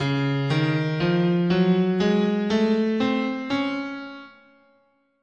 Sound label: C sharp Harmonic Major, scale